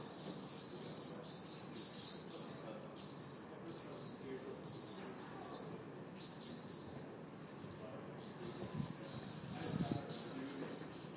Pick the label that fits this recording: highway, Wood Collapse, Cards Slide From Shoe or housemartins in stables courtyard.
housemartins in stables courtyard